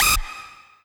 lead

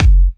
drum hit whistle

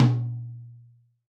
tom c#5

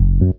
bass

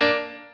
piano

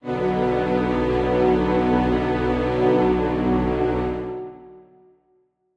Strings start

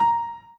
Piano Chord

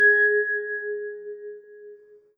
vibraphone G#5

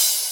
psst

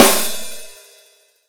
soft hit clap

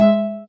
soft hitwhistle; osu

